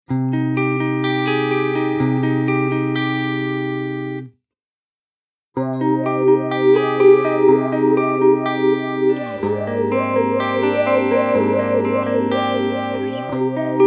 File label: formant, mobius